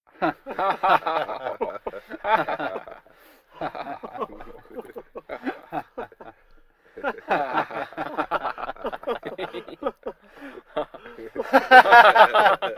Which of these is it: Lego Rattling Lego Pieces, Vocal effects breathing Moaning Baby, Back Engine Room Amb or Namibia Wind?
Vocal effects breathing Moaning Baby